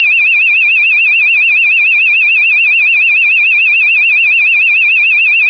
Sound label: tone